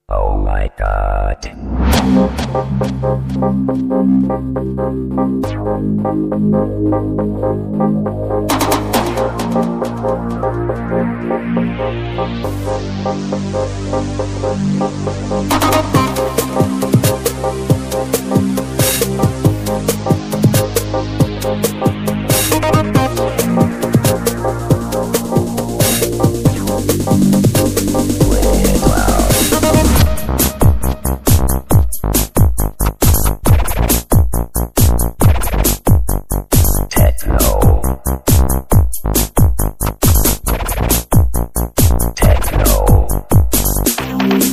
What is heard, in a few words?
Techno Boogie